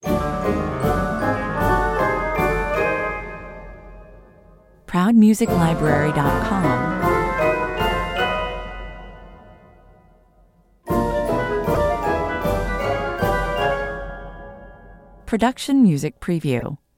cartoon magic sequence

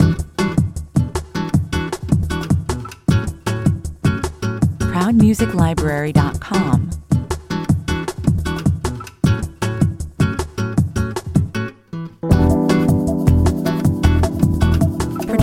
brazilian heat 155 bpm

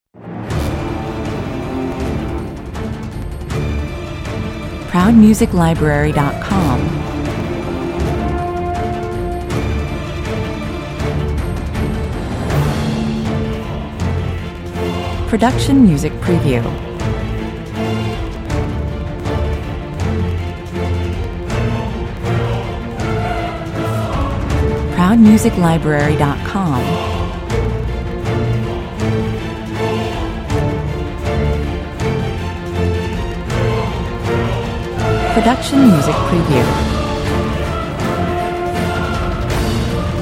with choir

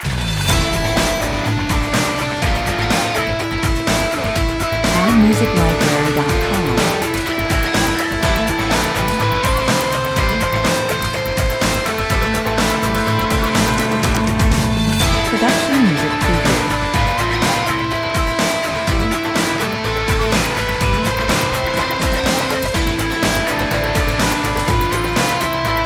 modern ending 124 bpm

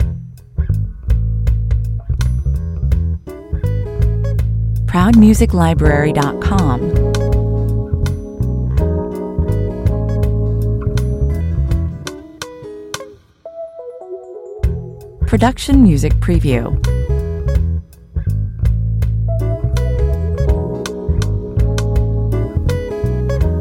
on hold, loops, 82bpm

